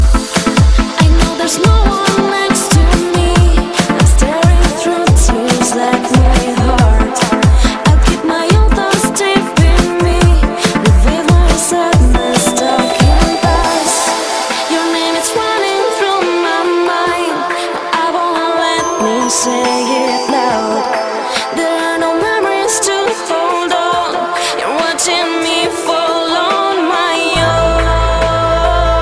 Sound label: House Mix